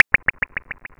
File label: higheko